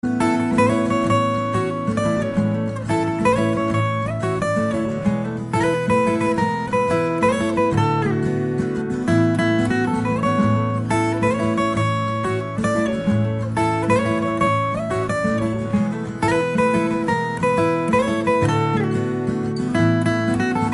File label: Instrumental; Yeh Jawaani Hai Deewani